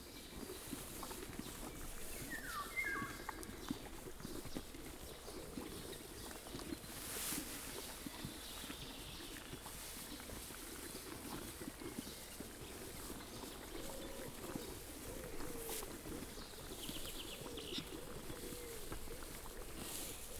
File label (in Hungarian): kettő prüszkölés, pónilő; felsőlajos zoo 2015; cardioid